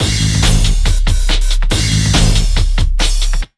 TECH 140, Big Beat Drumloops

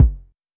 Polite kicks F1, Short; LIMAT Div Dept; Drums

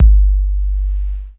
KICK, Hip Hop

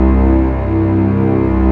STR Orchestral Strings

